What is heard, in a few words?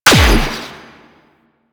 Air Pistol Shot; Warframe; Corpus